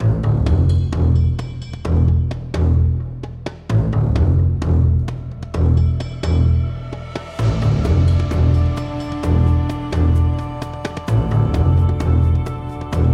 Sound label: Combat Music Loop; Iron Tides macOS Windows gamerip 2017